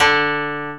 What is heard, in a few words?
KOTO D2, Asian Traditions; USB Soundscan vol.60 - Asian Traditions; AKAI